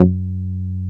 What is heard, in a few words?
DRAG F#2, BASSES